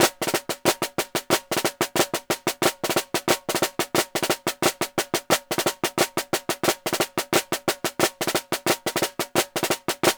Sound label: snare